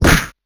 Anim Collision

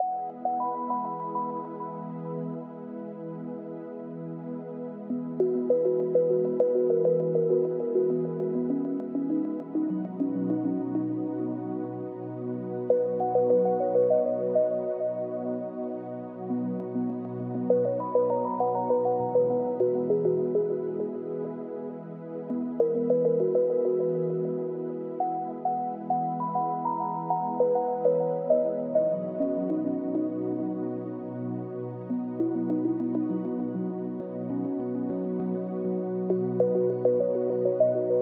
music game loop no tail

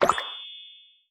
magic Wand

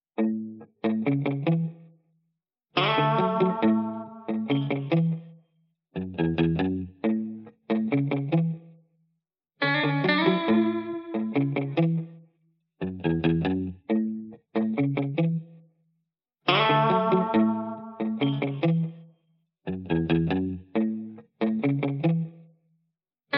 music home guitar

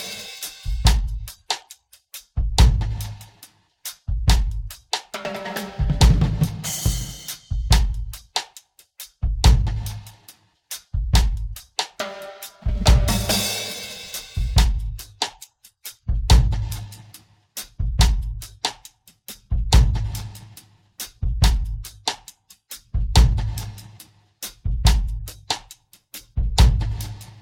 music home drum

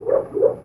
Ghost Fire

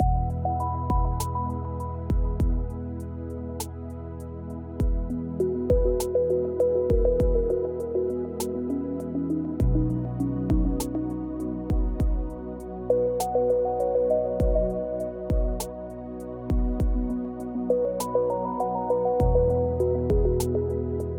music home loop

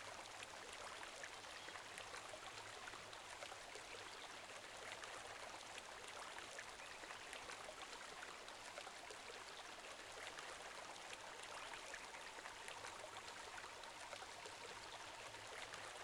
amb stream

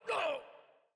Ghost Be Hit

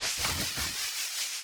arrow Move

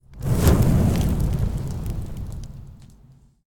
Dragon Attack Shield

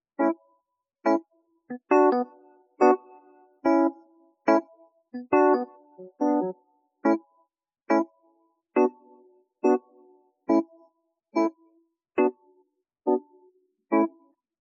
music home epiano

